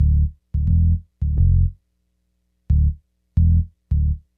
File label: Hip Hop and R&B Drumloops